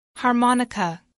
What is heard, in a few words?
harmonica